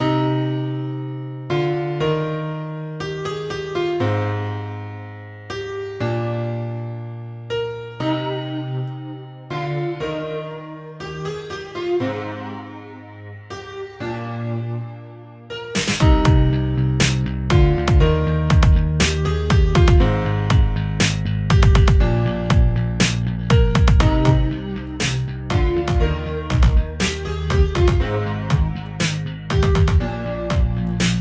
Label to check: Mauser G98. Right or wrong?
Wrong